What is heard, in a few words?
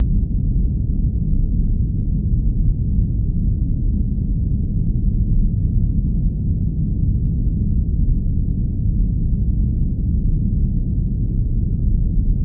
Earth Rotation Hvy Low P, deep